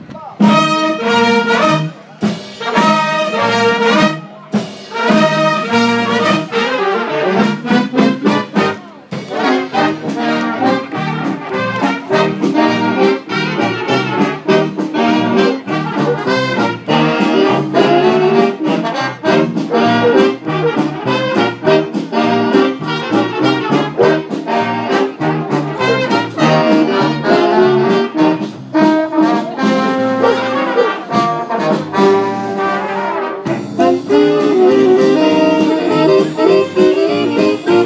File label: swing